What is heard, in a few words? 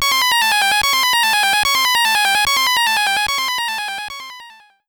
Miss; Game & Watch Gallery 2; GB; Helmet